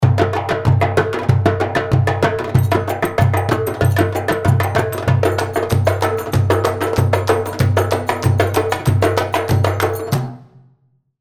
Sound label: Fallahi 095, darbuka